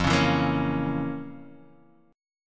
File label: F Major 7th Suspended 4th Sharp 5th, guitar chord